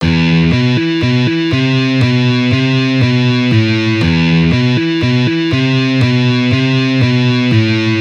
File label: Easy Guitar Riffs